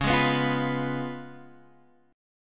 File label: Db 7th Db down Guitar Half Step Down